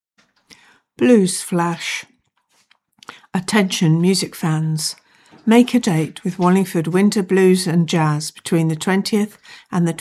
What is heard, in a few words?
Blues